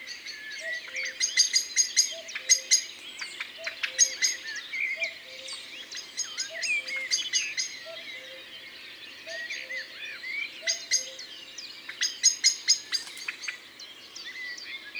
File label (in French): with energy merle noir; TOULON; PRESQUILE